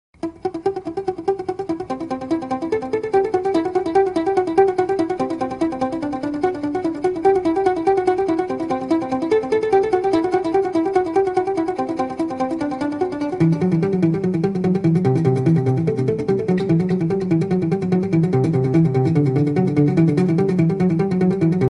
Guitar Trance

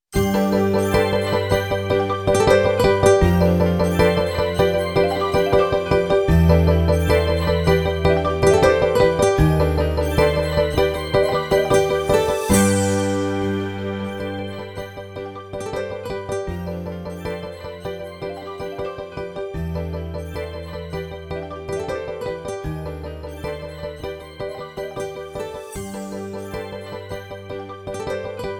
Happy Sounds Of Music

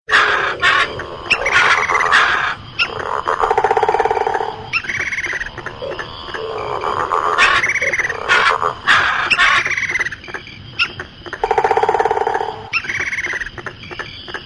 Jungle And Large Insects

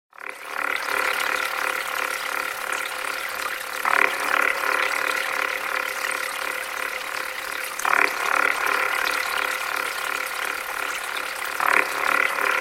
Tone Insects

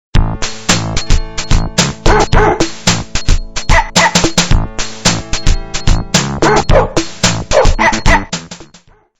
Music And Dogs

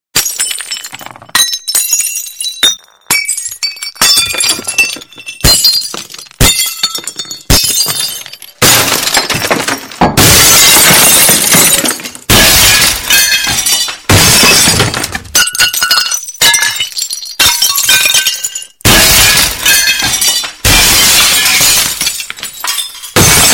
Glass Break 3D

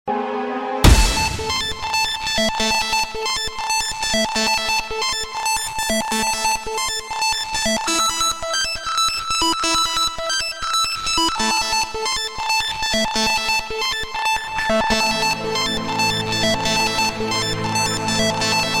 Tekno Riff

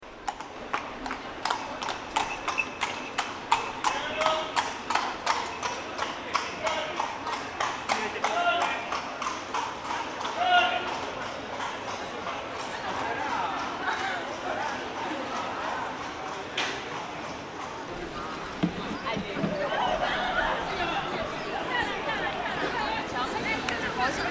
Horse Shoe Sound

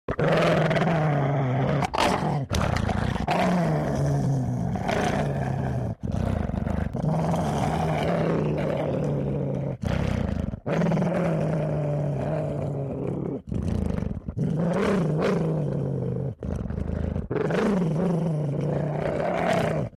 Angry Dog

